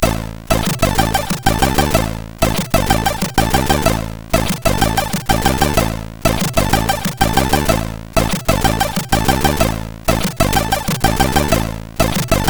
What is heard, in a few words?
Offbeat, CSG8580R5